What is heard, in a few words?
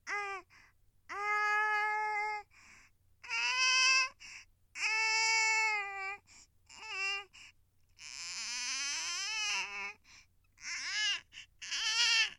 mmm